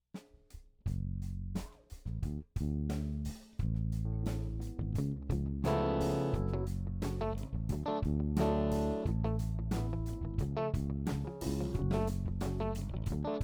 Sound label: Rough Mix L